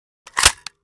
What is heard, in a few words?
mag in; weapons; m16; Urban-Coalition; Decay; arccw ud